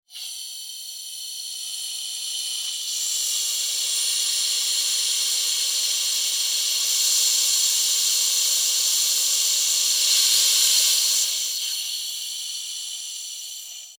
Extermination, Bug, Bomb, Release, Pesticide, Insect; Bombs; Explosions; Blastwave